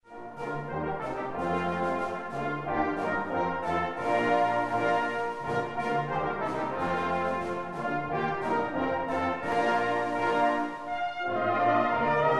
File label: ding dong, brass band